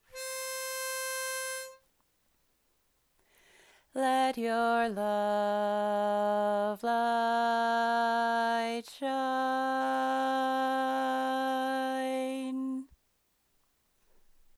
Bass, barbershop

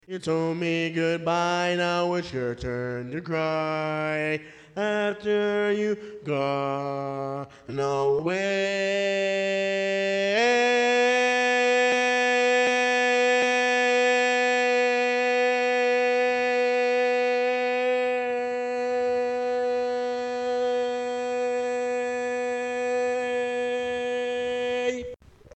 Bass, barbershop

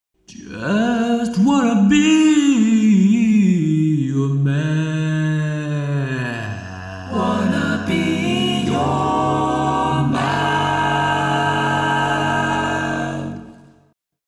bass challenge All Parts, barbershop

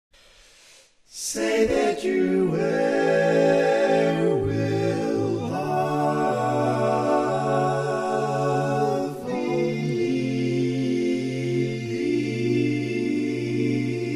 barbershop tags, all parts

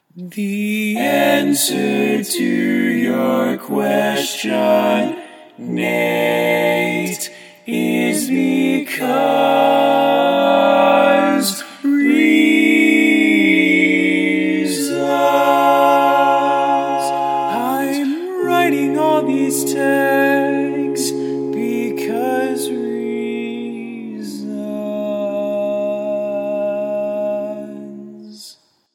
barbershop tags, all parts

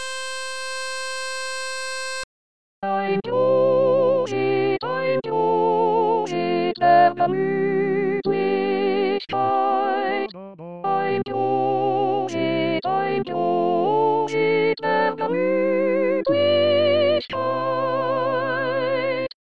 Tenor, barbershop

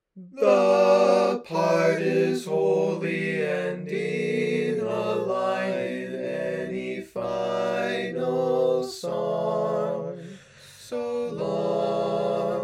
Bass, barbershop tags

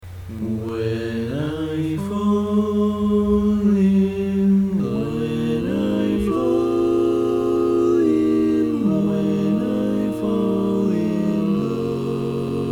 All Parts, barbershop

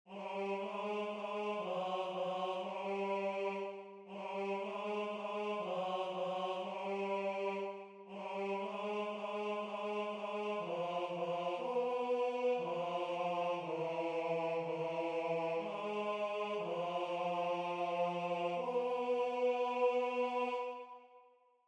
Bass

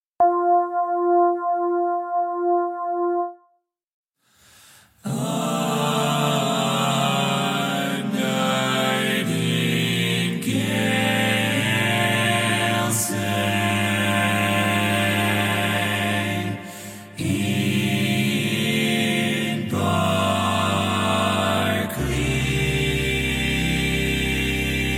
Bass

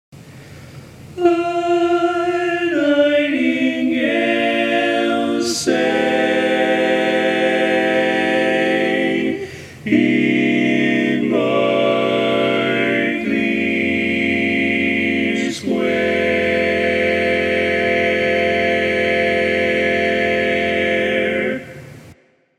All Parts, barbershop

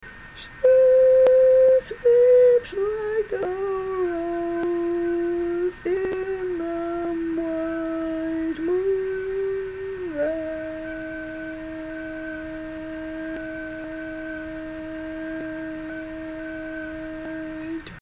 Tenor

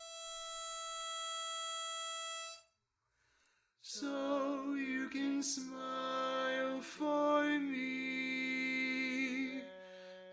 Lead, Barbershop Tags

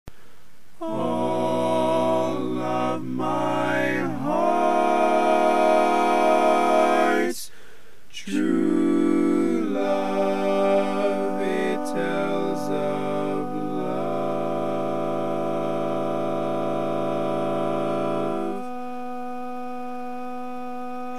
Tenor, Barbershop Tags

